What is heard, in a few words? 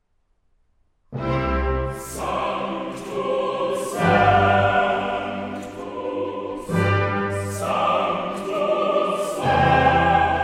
Missa in C Sanctus; KV167; in honorem S[anctissi]mae Trinitatis; Mozart; Missae and Requiem; Davis, Gardiner